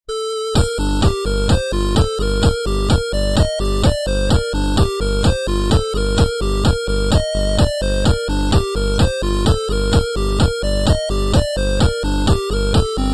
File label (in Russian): 8-bit muzyka na sotovyi telefon ringtony